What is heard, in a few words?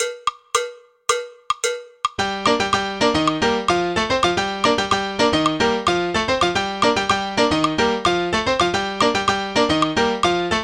tumbao fast